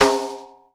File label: NAT SN TONE; CONSTRUCT 2